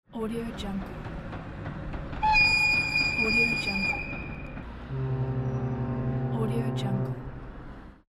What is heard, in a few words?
train or locomotive with whistle; jdproduction